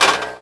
clunk, car; Colin McRae Rally 3; XBOX

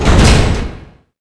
door mine end; doors elevators; Star Wars Obi-Wan; XBOX

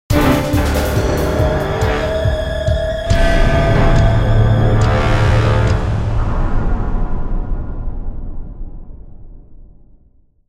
Temple Fight Lose; music; The Da Vinci Code; XBOX

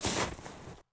FS Snow, footsteps

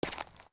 FS Dirt, Footsteps

